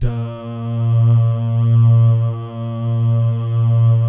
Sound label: DAH, Vocal Jazz; ILIO